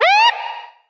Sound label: police siren, zaps and beeps